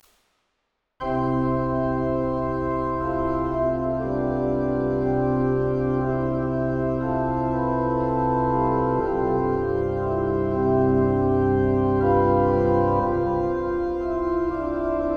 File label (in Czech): varhany katedrala